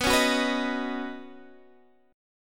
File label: D sharp Minor 7th Sharp 5th